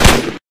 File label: rangedweapons smg; minetest